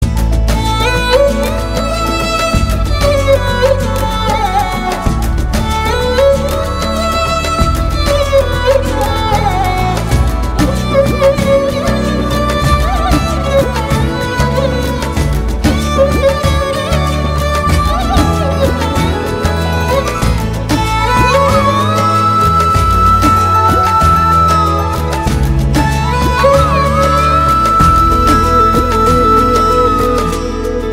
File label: Love Bgm